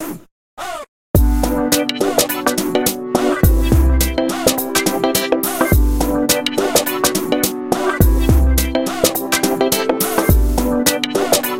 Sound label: Boss Win, gamerip